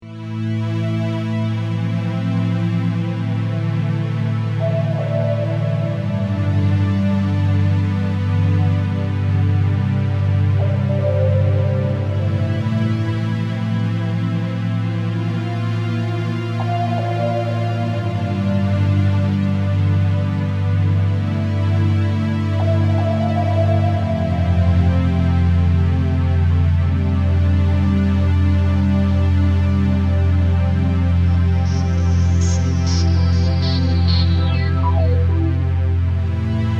ambient; X-Bow Software; 2004 - Star Wolves

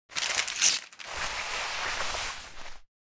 bandage use; Stalker Anomaly; interface item usage

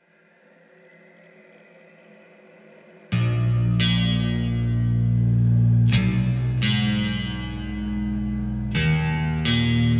instrumental

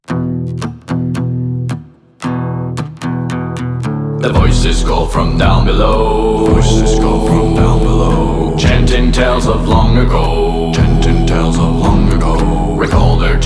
acoustic

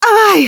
hit, human woman fight; Stalker Anomaly

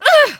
hit, human woman fight; Stalker Anomaly

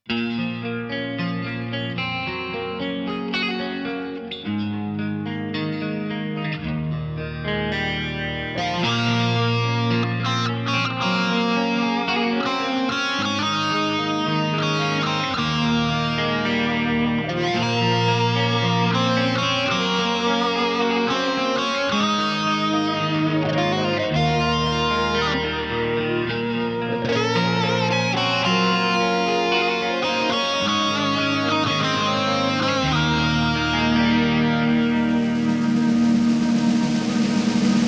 Instrumental

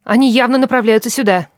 attack many, human woman fight attack; Stalker Anomaly

